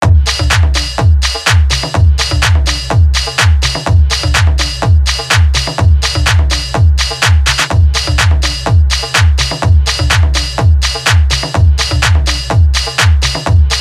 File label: Best techno DJ music